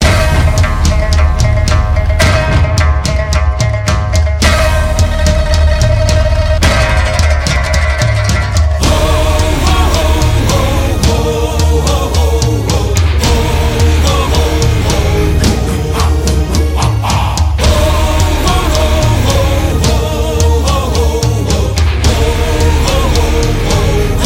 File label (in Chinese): 前奏琵琶